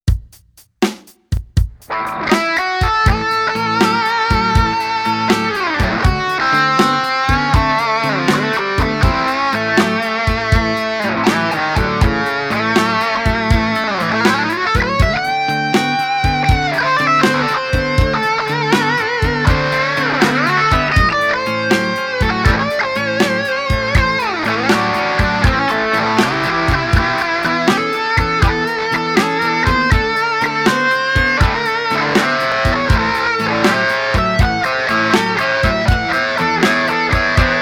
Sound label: blues